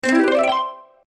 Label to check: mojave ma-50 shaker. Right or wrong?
Wrong